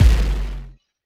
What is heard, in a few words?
Bomb Explode